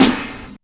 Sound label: damped snare drum c key; tuned drums c key